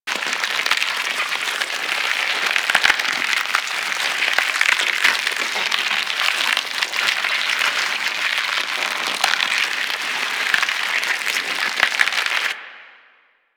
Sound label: Symbiotic Link Loop; Warframe; Warframes